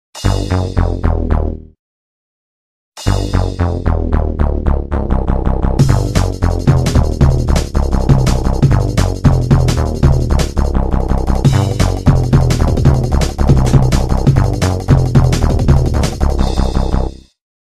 Edgy, Nokia Tones; Windows Phone apps

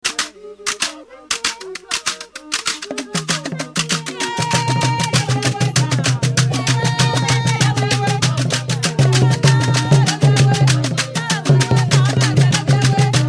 Drum; Sagem MY-V-75-CD

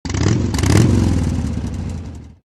Motorcycle, notifications; SU370 Optimus One; LG

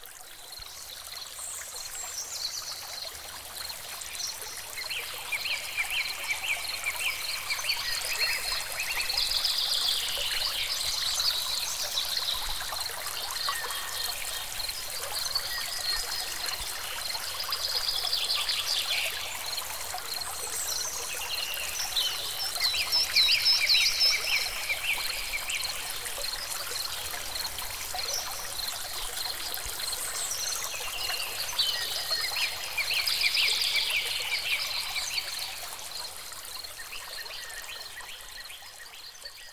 Rainforest, alarms; Highscreen; Power Rage

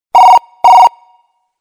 Phone, ringtones; Coolpad; Dazen-X7

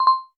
Video Pause; Honor; ui; MagicOS 7.1

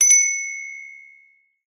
Hand Bell, notifications; Samsung; Galaxy Watch 8

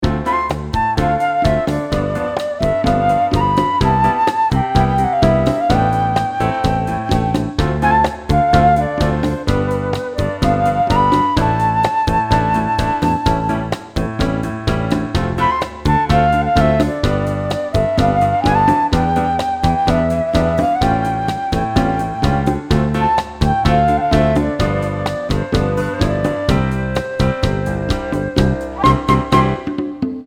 Cha Cha, ringtones; TP-LINK; Neffos C5

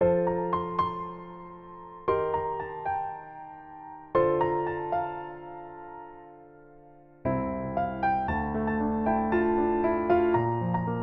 Go Beyond Piano, alarms; Honor MagicOS 7.1

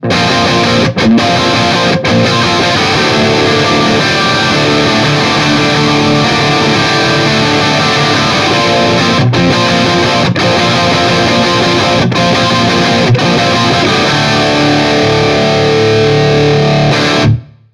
Rock, ringtones; Marshall; London KB 1501

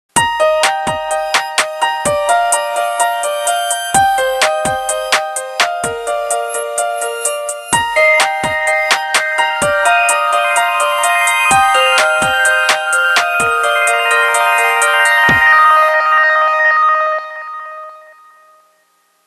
Ring DayDream; Microsoft; Ring Tone Pack 5

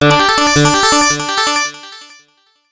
Notification Circle; Acer; Liquid-E1

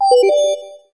Call Disconnect, Samsung SGH-i300

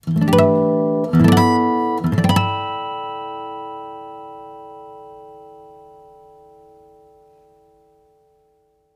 Acoustic

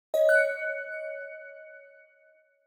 Wired Charging Started; Yandex Phone; ui

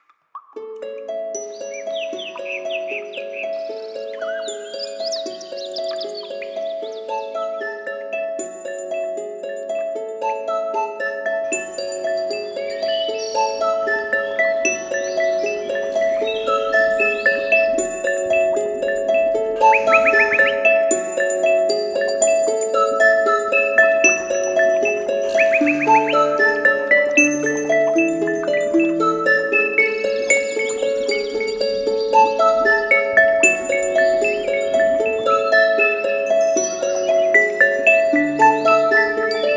Alarm Lights, alarms; Koobee; P1S-Pepsi

